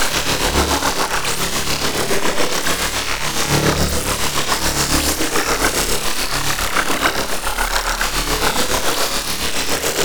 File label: Drop Beam Loop; Enemies; Weapons; Warframe; Tau; Sentient Dactolyst